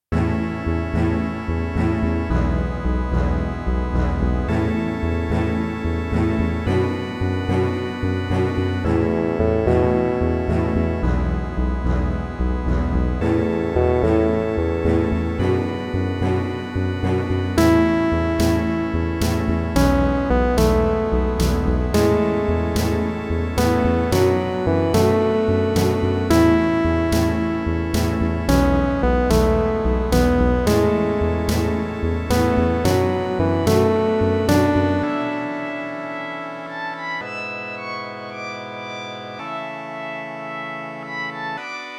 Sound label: game music - imperial city building strategy